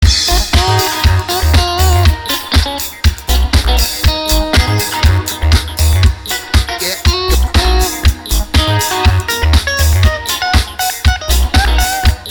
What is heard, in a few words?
Strum